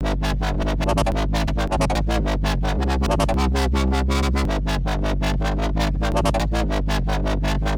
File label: bass